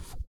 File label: ELASTC TUBA, Vocal Blues Soul; Spectrasonics; Planet